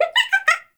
baby vocal singing

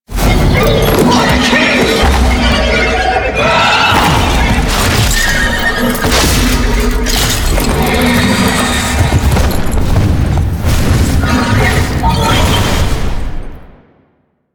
Steal Horse SFX; Duviri

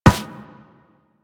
Operator Run Metal, Footsteps; Warframe

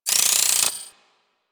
Credit Pickup Pile Large; UI; Pickups; Warframe

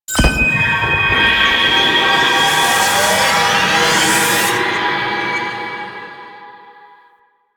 Activate Tower Power Stream

